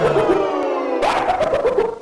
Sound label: laugh